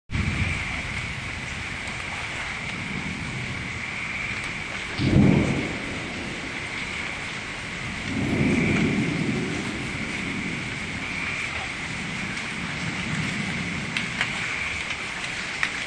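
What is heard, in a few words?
Thunder severe, nature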